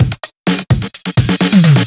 Minimal Fills, Minimal House; Minimal Loops

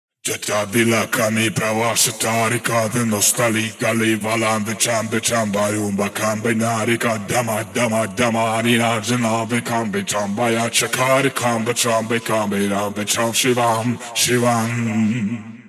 138 Shamanic Voice (Wet), Psytrance

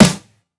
Snare, Psytrance; One Shots; Claps Snares

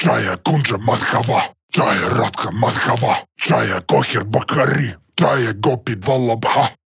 138 Shamanic Voice (Dry), Psytrance; Shamanic Voices

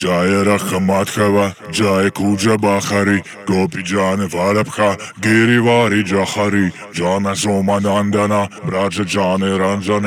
138 Shamanic Voice (Wet), Psytrance

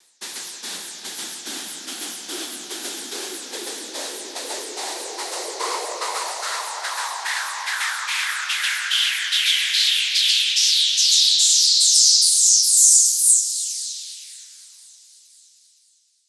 Noise FX; SFX

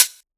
Hihat, Psytrance; One Shots

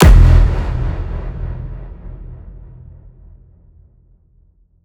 Impact; Singomakers; Infected Psytrance; Impacts

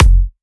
Kick, Psytrance; One Shots

